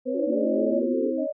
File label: line 2 sine